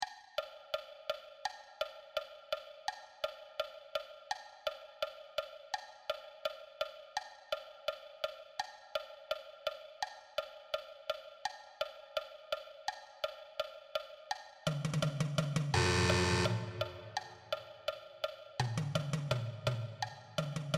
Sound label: Marching Tenor Drums